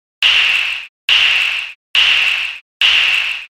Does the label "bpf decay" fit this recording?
Yes